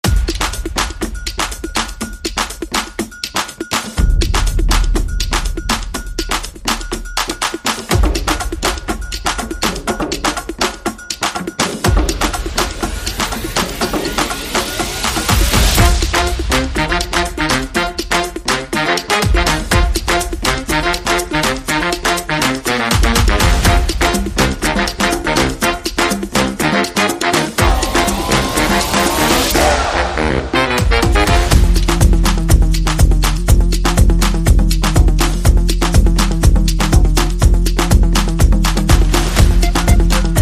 No Game Effects

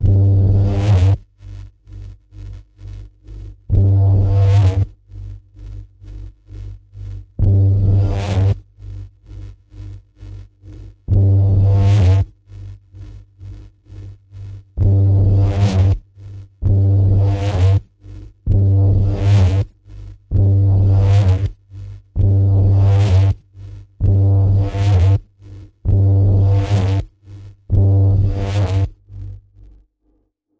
Ominous Pulse G, Key of G

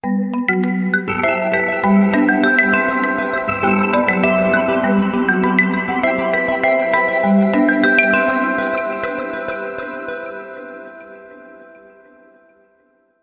Bells

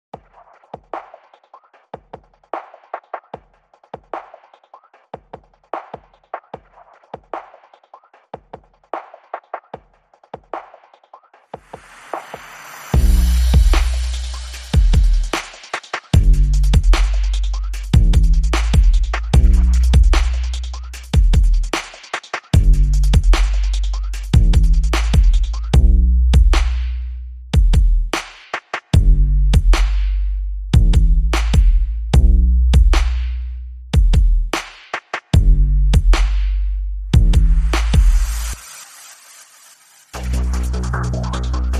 Drums Bass and SFX Only

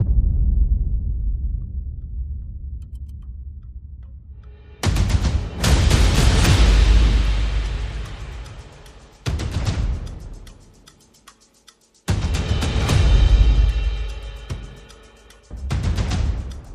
Percussion and Effects

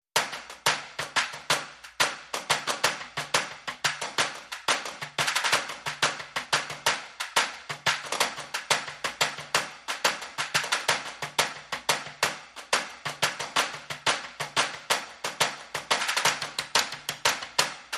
Tension Pattern Only

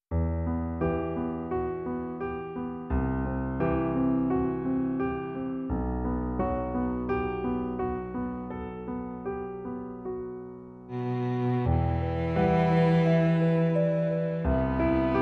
Cello

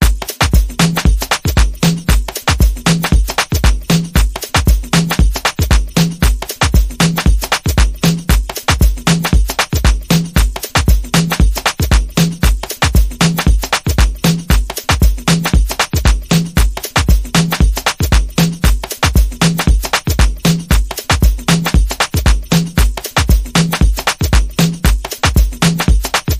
Vox Doubler Beat

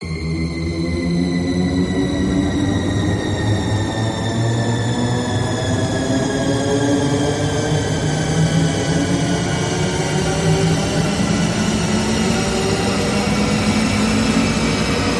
Rise Long Opposites Attract

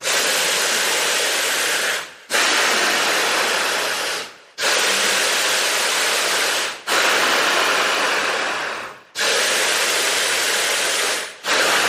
Inhale Exhale Pulse

